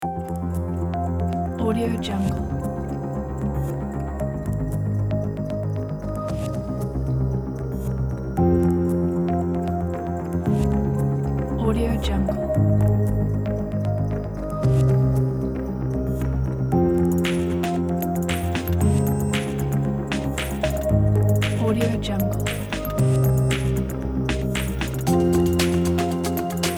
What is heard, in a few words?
electronic technology pack